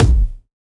Kick

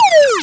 Lazer

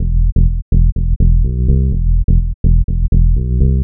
Bass 125bpm Em Synth

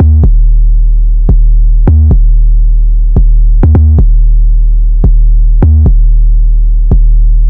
808 Boom D-min

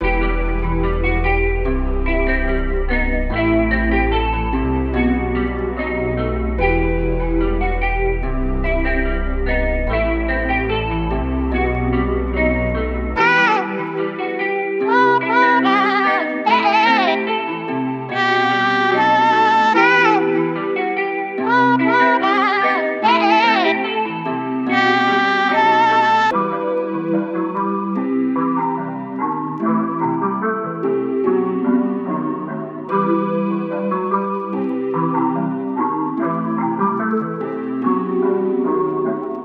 float 146 bpm

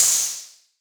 NOISE HAT

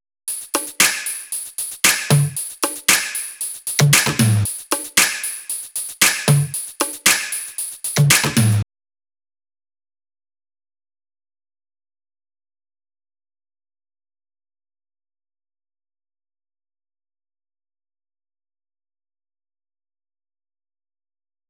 115 DRUMS Top Loop

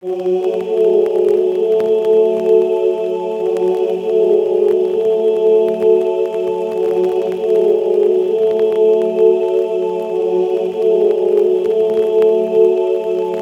Voice 143bpm F m Digital Choir Trap

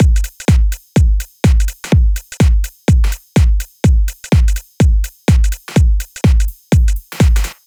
Beat 120BPM Low Deep

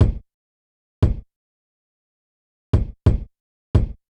Kick

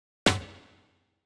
Perc Loop 126

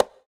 OneShot Bongo

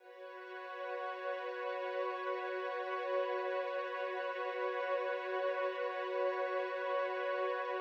123 F- Pad Loop

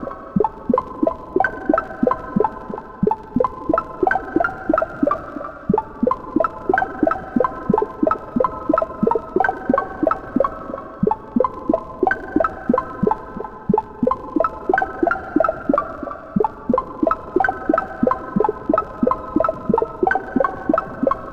90 arp Fmaj; mango

